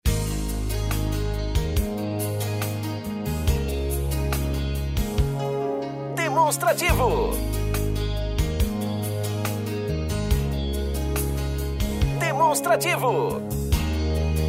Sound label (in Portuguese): tema espera